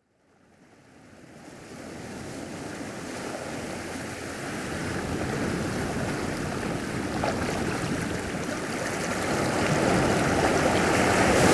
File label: Relaxing Ocean Waves; music for meditation